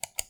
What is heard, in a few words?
Click Mouse